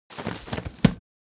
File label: Small umbrella open